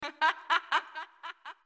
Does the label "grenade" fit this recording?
No